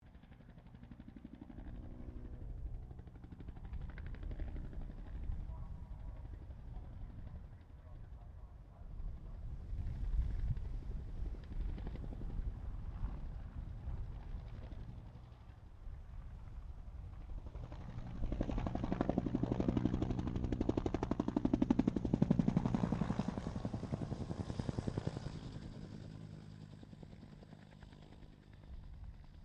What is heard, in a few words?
HELICOPTER BELL UH1 IROQUIOS PASS; RAN UH-1 Iroguios; Helicopter Sound Effects